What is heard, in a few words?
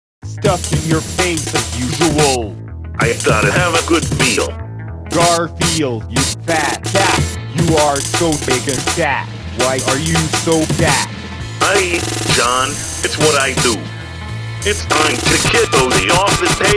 amen break cover